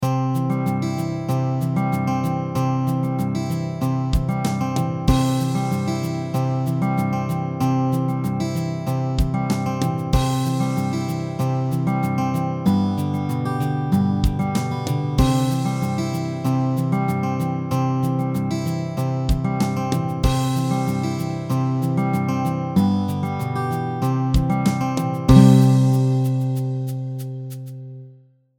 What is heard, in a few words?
NO MELODY, ukulele; Rainbow Ukulele